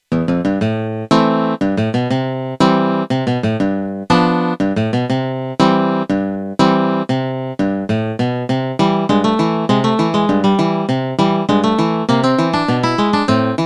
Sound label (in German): Rag in C Dur